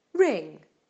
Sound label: ring